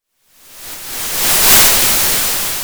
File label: Sound of waves crashing on a shore